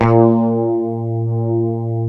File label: B 2 STRAT MF, HYPED STRAT, Guitars; Spectrasonics; Hans Zimmer Guitars VOL-1; 90's Sample CDs